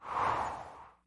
menu open; freecell solitaire

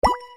ui moves Increase; sweet shuffle

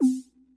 rollover; freecell solitaire

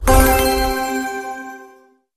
ui score Begins Counting; sweet shuffle

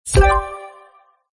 bomb Charged; word wipe

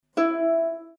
select tile blocked; mahjongg solitaire

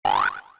reset; mine sweeper epoch times

